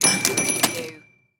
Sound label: Pinball Machine Bump